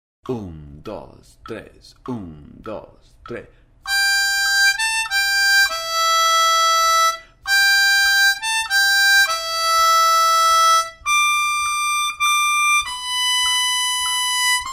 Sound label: Silent Night, armonica